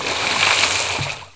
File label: battle; batword